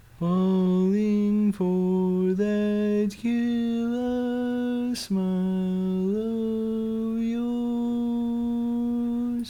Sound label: Lead, barbershop